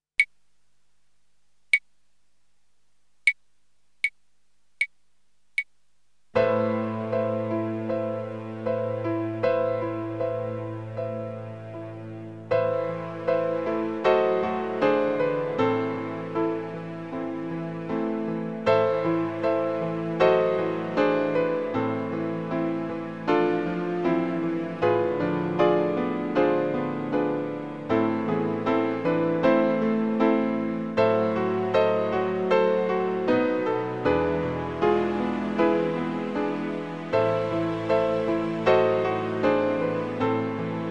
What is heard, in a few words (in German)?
Slawischer Marsch